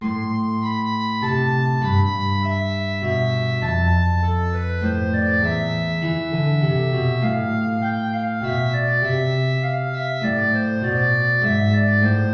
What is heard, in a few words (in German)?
Menuet Klarinette in Bb Gitarre hoch; BWV Anh 115